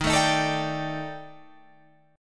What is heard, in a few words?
D sharp Major 7th Flat 5th, down, chord